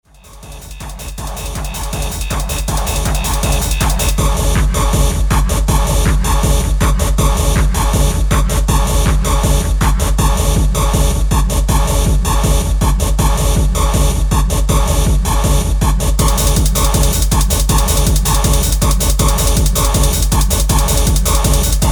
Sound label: Trance